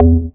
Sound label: Syn Bass; Equipped Music